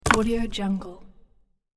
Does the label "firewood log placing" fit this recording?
Yes